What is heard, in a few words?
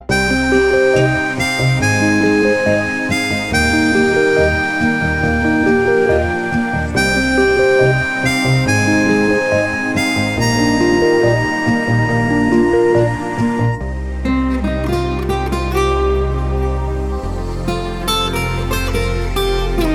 unplugged ringtone